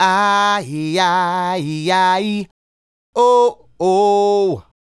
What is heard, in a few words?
Male Solo, Hip-Hop and RnB Vocals